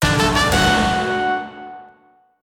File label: Jingle Sortie; Taiko no Tatsujin V Version; PS Vita